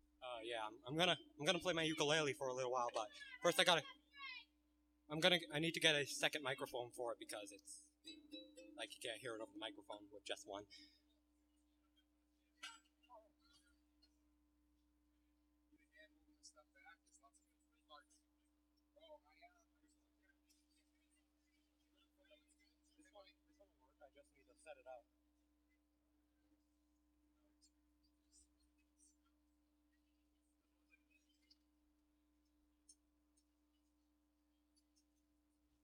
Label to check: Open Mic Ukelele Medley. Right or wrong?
Right